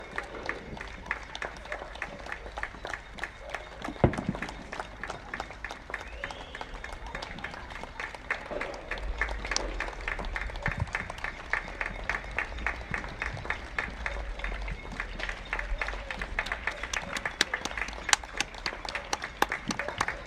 Thursday night NHS thank you

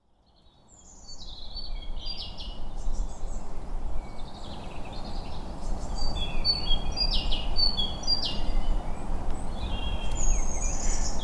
Birdsong

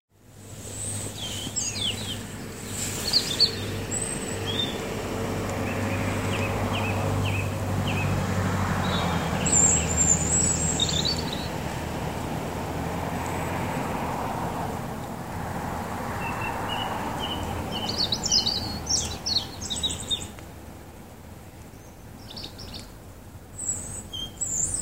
Birdsong

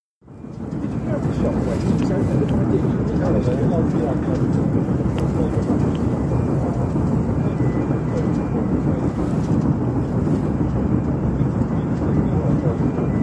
Rainham Marsh

